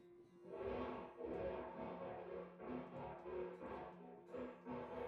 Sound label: OKTAVAS